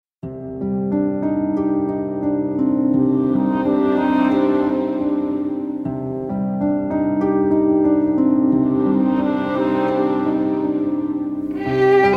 Violin

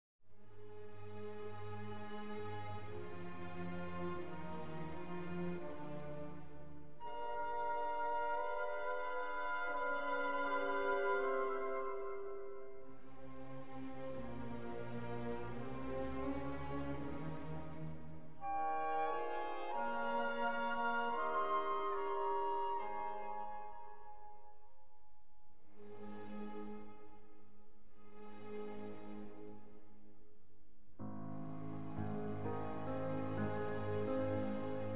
Piano Concerto in F Minor, Larghetto

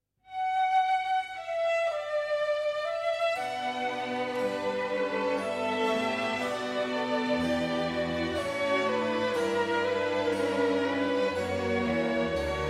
Orchestral Suite in B minor, Sarabande; BWV 1067

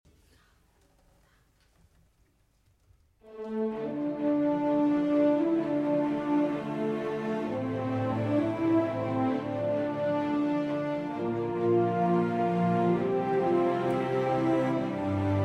Orchestral

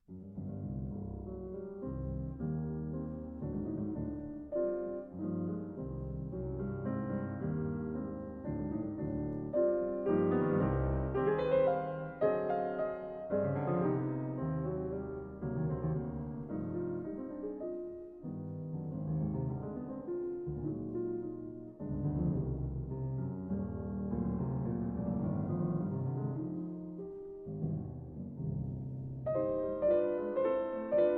Piano Sonata in C Minor Allegro molto sostenuto

